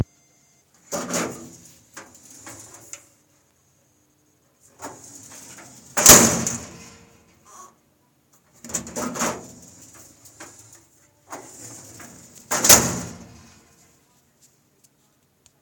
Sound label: filing cabinet